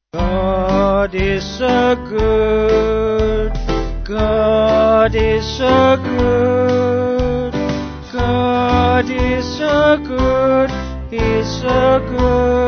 Vocals Band; Sung Lyrics

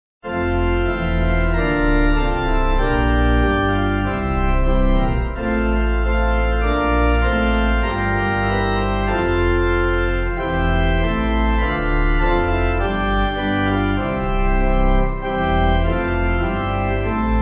Pipe

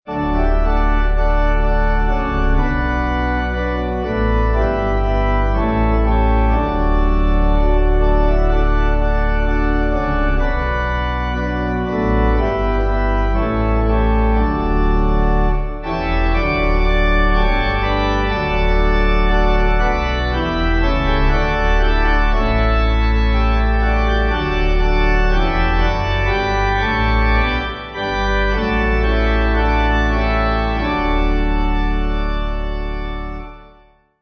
Pipe

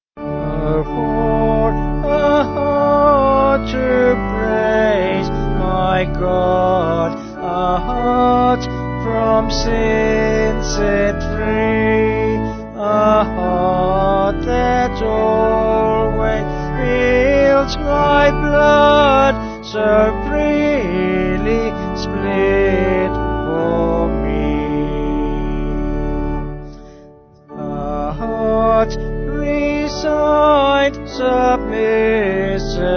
Vocal Organ

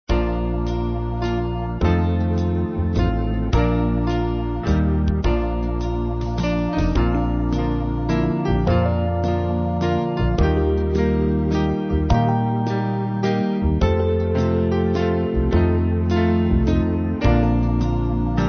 Hymn; The Old Rugged Cross

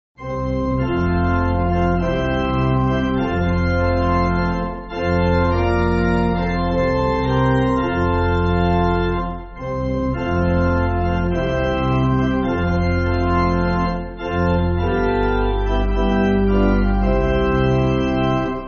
Pipe Organ, Backing Files for Churches; Our Lamps Are Trimmed

